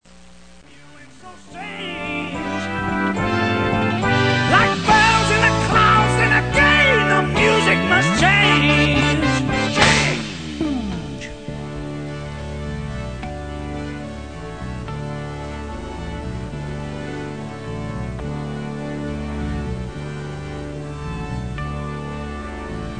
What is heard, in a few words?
music